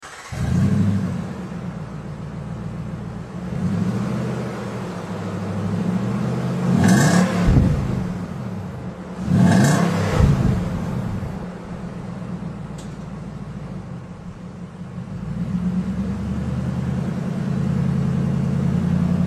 Nissan Titan 5.6L, exhaust sounds

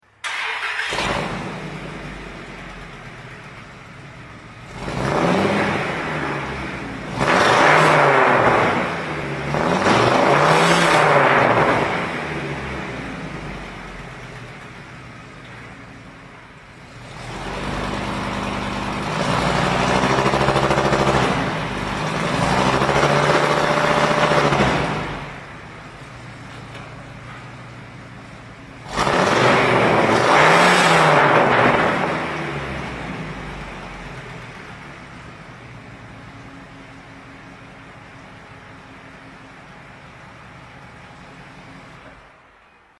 Dodge 2500 V-10 24 in glass 3 in stacks nc

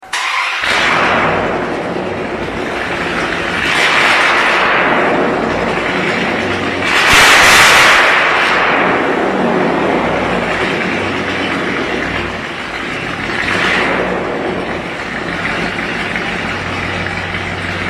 Dodge Hemi Stacks no mufflers, exhaust sounds

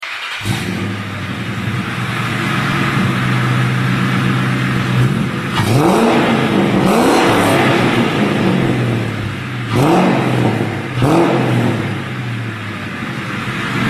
Mustang X Magnaflows

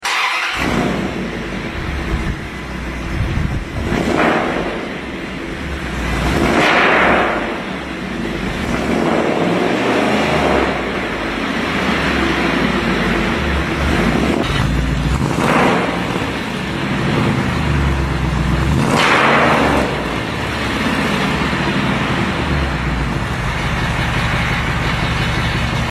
Dodge Hemi Stacks 30 glass, exhaust sounds

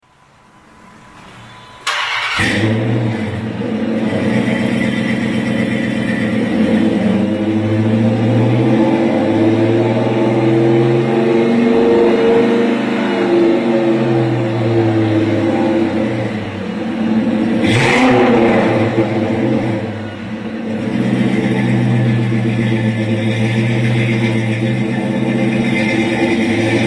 Charger Hemi, exhaust sounds

